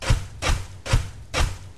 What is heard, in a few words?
run; star wars rebels team tactis